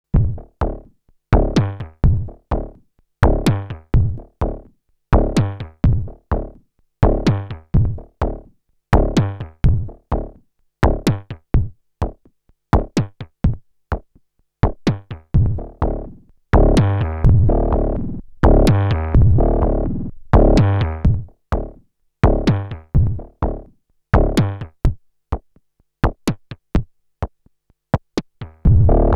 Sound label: Behringer Edge